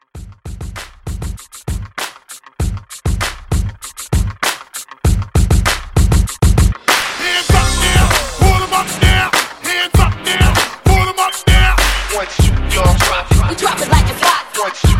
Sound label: Throwback Hype 98